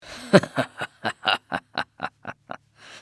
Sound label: haha; NWNDS; edwin